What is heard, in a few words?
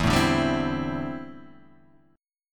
D 7th Suspended 2nd Suspended 4th E